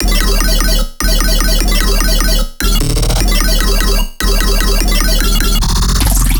Bass Loop, Dubstep, 150bpm; X Oo thats Cile; Ghosthack; Dubstep Sound Arsenal

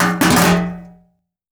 metallic crash

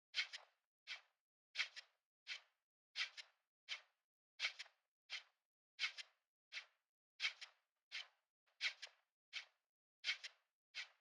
Drum Loop, 85 BPM Shaker, Lofi; Loop Stems; Cymatics; GHOST (Beta Pack); Breach Drum Loop

